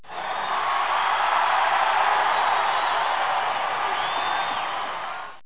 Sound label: SWELL; DECATHALON